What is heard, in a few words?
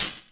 Tamb; Tambourine; Cymbals; Vengeance Samples